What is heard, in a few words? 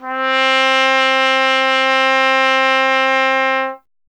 Trumpet C 0; Miroslav Vitous - Solo Instruments VOL-1